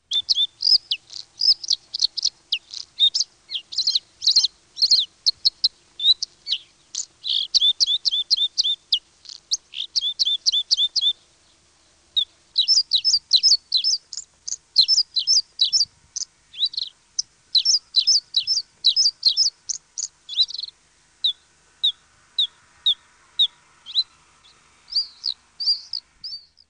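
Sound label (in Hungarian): Kékbegy; Madarak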